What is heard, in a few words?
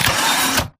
Stalker Mvmt Servo Damaged Headpivot Medium; Titanfall 2